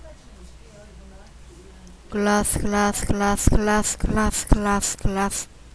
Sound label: glass